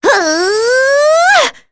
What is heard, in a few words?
Vox Casting; Talisha; kingsraid